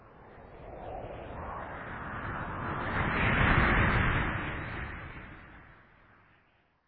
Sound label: gust